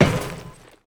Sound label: ladder, footsteps; player